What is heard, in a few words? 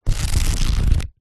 household book paperback pages flick through